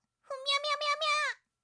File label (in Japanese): デバイスの接続の失敗